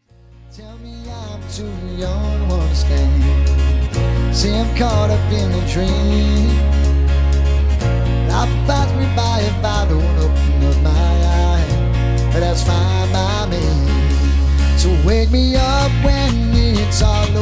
Rock, LIVE

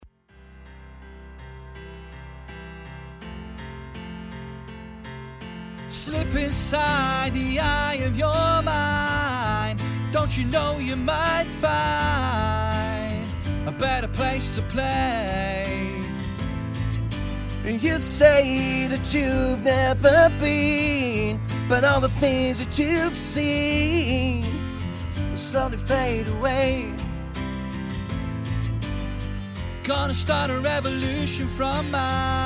Live Lounge, Unplugged mix; Hi DEF Live Lounge; HD Acoustic

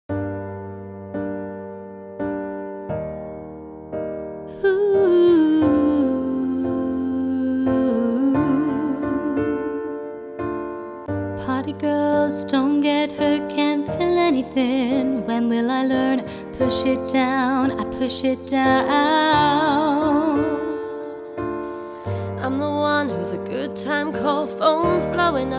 Female Vocal Harmony Trio; Girl Project

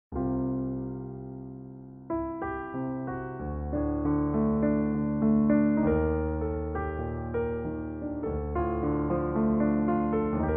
Solo Pianist

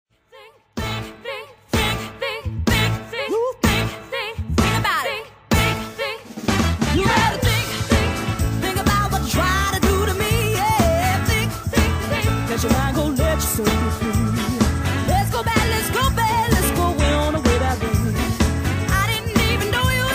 Motown

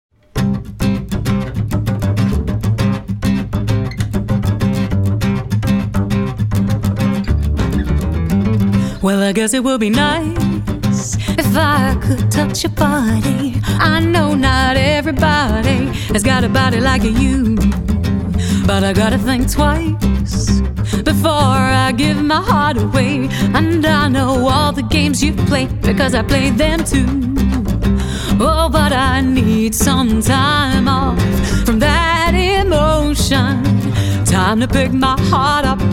no sax; Victoria Fox Collective

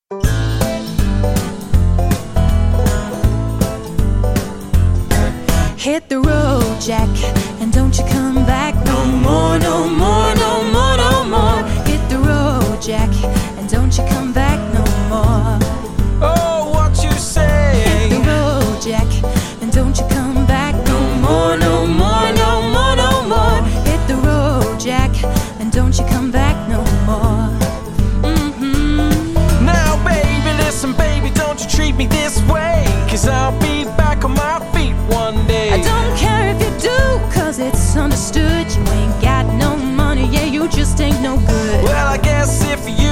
Vintage Folk Band

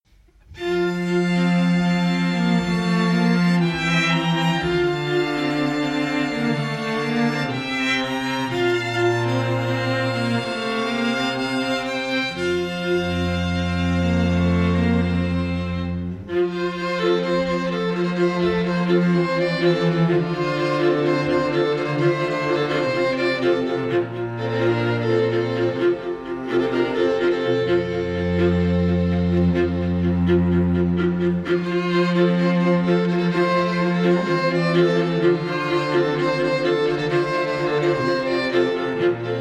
String Quartet; Manchester String Quartet; Many Strings Quartet

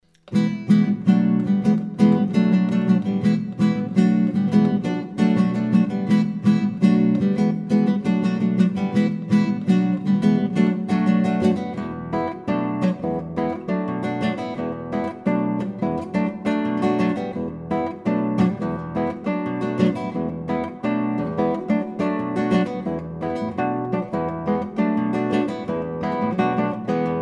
Solo Guitar

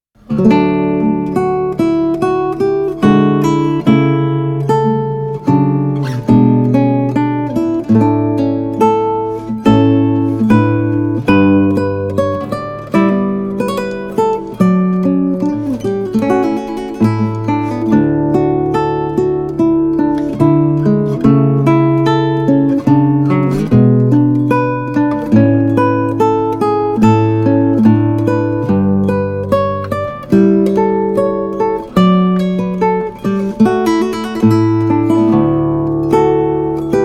Guitarist, Spanish Guitarist

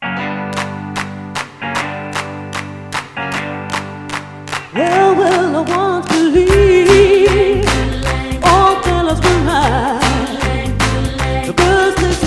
Sweet Soul Motown

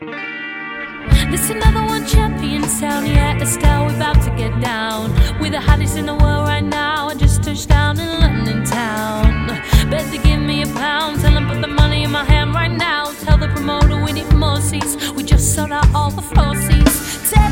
Soul

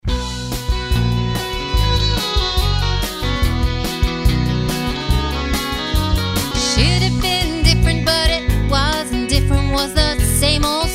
Hoedown Band, Medley

